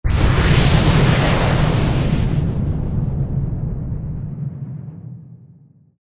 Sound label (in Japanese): 風魔法 大; 魔法効果音